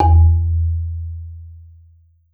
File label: Marimba E1; Sampleheads - Dave Samuels Marimba & Vibes